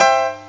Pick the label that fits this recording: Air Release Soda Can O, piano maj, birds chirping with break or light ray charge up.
piano maj